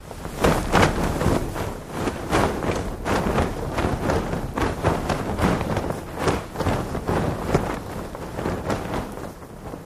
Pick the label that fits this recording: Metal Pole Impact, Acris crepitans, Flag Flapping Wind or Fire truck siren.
Flag Flapping Wind